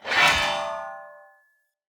Great Sword Whoosh Metal; Weapons; Swords; Dendrit Ara Great Sword

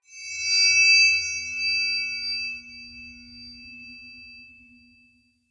Witch Station Ruins Soul Chime; Ambience; Soul Plane

